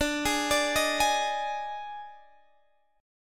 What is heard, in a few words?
D sharp Major 7th Suspended 4th, Guitar